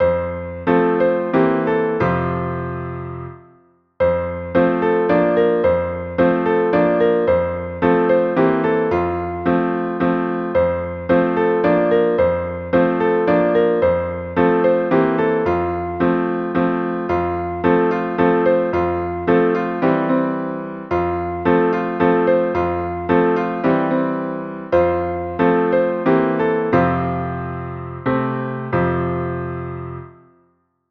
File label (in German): Klavier